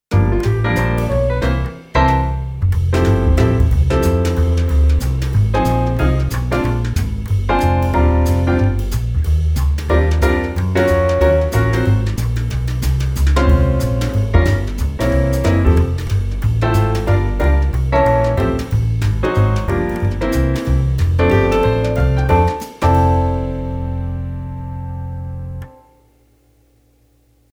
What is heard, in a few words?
solo key